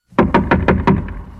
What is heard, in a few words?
Door Knock